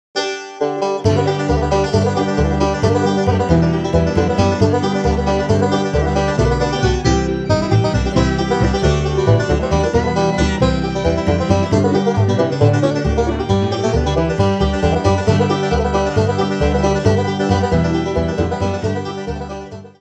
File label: Order Stop, banjo, bluegrass